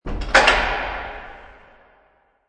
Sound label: Dungeon Door; Dungeon door being closed with plenty of reverb; Michael Huang